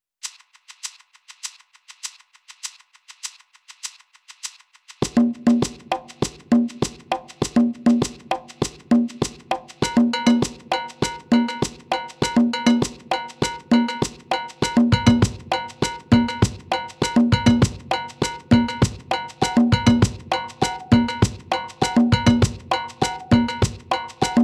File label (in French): chanson version instrumental